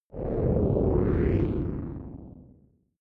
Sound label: break down